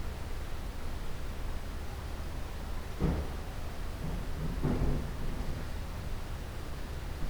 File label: office type swing door sounds